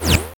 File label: SYN FX; X-Static Goldmine 1